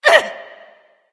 grunt jump; smite; jingwei

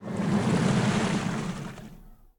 glide; smite; poseidon

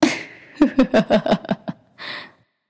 laugh; smite; voicepack; nuwa

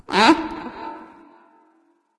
defeat; bastet

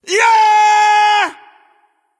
battle cry; smite; voicepack; sobek

